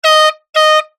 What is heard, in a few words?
sport air horn 2x blasts; zapsplat